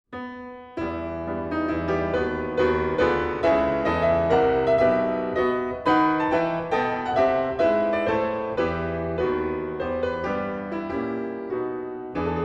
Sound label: Piano Concerto in E minor Allegro maestoso; Chopin; No.1; Op.11